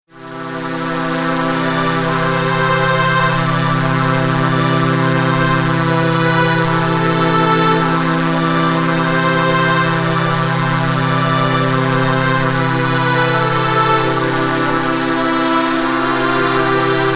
Akai MPC 2.10 Solina

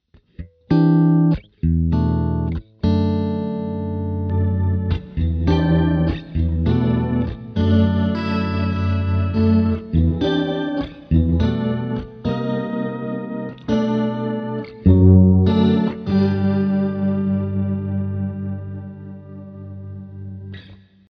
Ramp Pan; FEVER PITCH

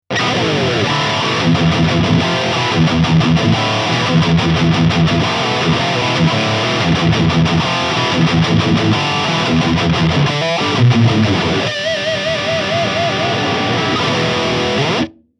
ESP LTD AA 1 BLKS High Gain; Alan Ashby

